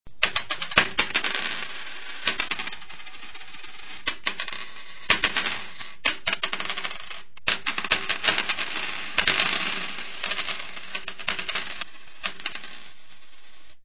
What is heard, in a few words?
coins